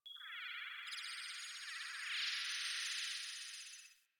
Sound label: Venus Landscape Distant Corpus Texture; Warframe; Venus Open World